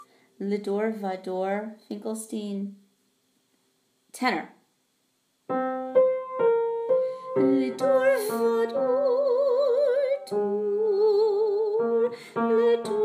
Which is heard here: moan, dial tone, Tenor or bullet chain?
Tenor